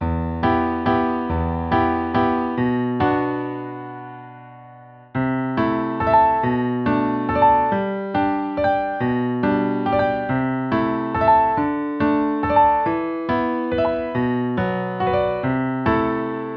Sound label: accomp. w o melody